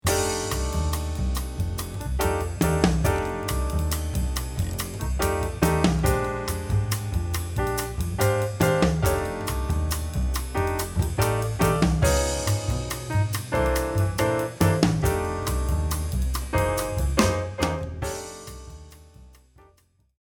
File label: 7/4 Blues Trio